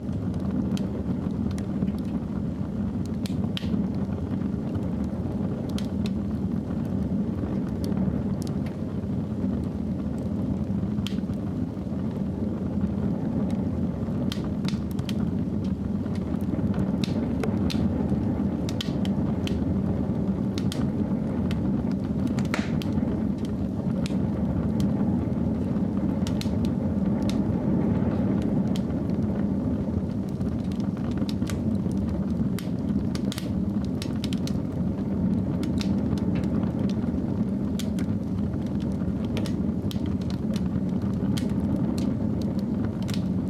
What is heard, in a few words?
open fire, ambient fireplace